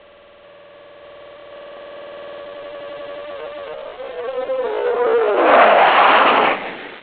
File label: fly by